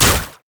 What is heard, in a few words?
grasping roots hold; Zyra; LoL